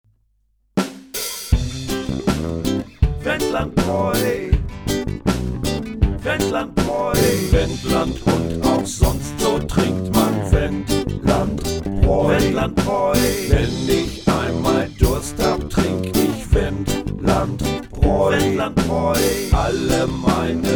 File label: reggae